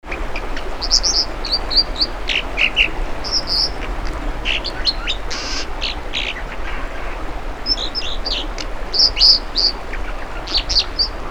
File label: American Dipper Cinclus mexicanus